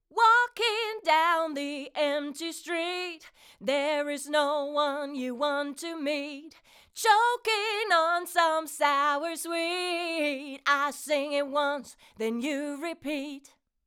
Vocal ohne; Rupert Neve Designs 5254

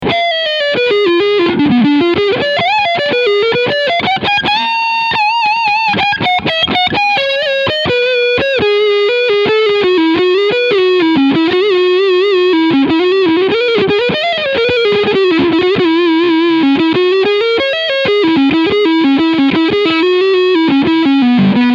E Gitarre Leadsound Overdrive Front Pickup; Ibanez ART120QA SB

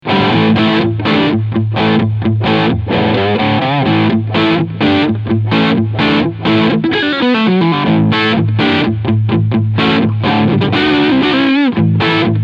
Crunchsound beide PUs

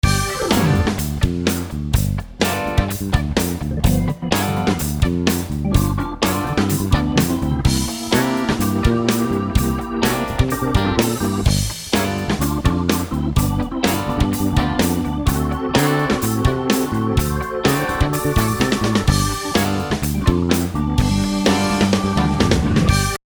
Rockfunk

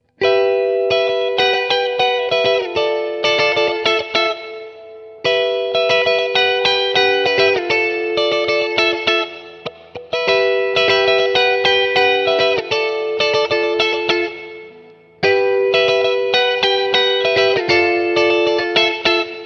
Beide clean

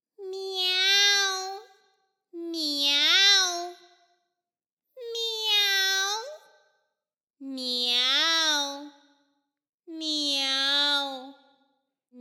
Miau

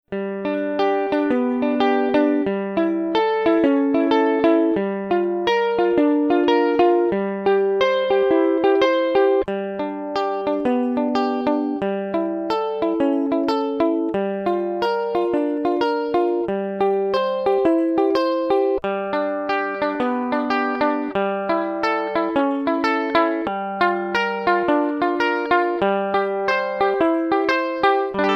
E Uke DI Picking Hals Beide Bridge

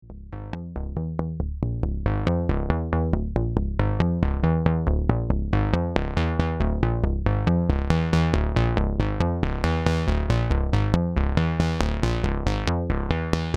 Compressed Sequenz

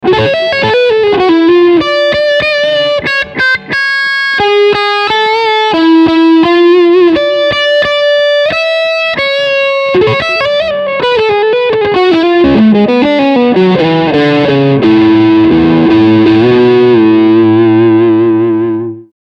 Steg PU SC Modus Overdrive

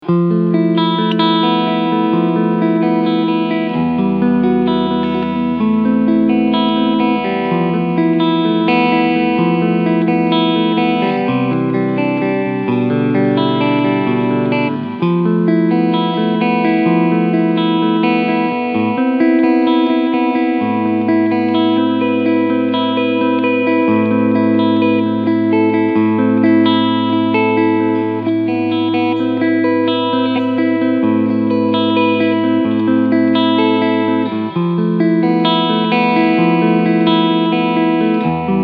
Fusion II HH beide PUs Clean SC Modus; Roasted SSP

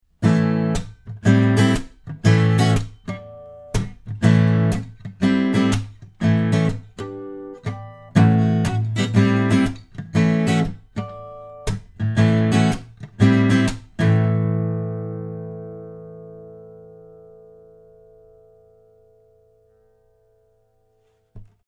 Akku Blumlein

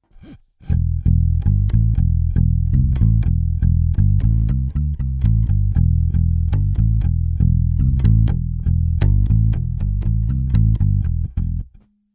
High Z In Bass Godin Fretless etwas übersteuert; Millennia HV35

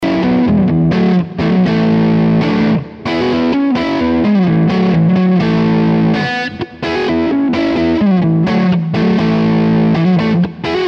Les Paul Modern Hals Pickup Crunch

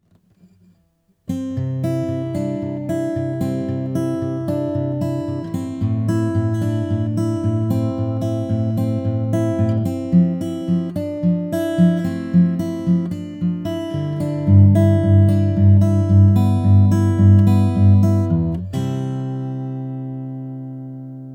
Sonic Farm Creamer SE Test Akustikgitarre

